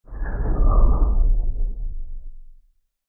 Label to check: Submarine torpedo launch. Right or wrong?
Right